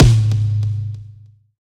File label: drum; smite; god raijin